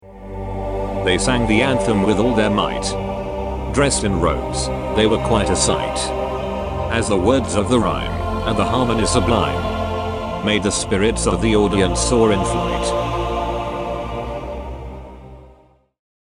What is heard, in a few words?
choir voice music